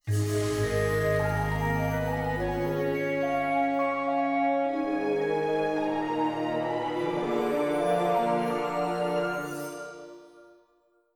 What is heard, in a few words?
Stinger Solved; adam s venture origins